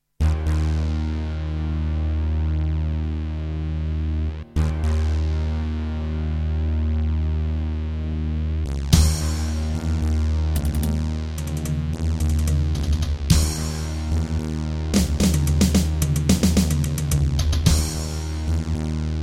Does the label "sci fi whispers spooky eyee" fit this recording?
No